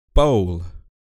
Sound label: bowl